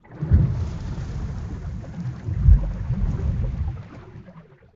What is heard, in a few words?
submarines engines